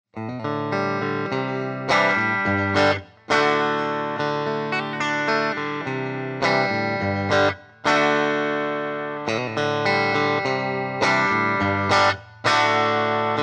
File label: 6S9H bridge